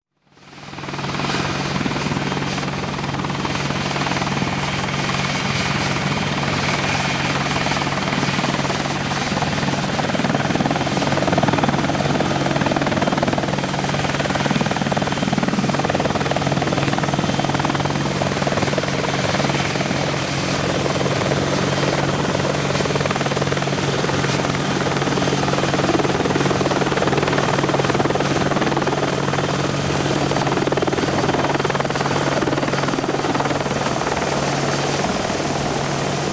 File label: Helicopter; Sound Ideas 6019